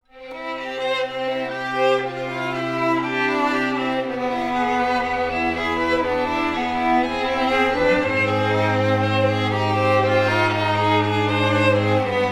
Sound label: Quartetto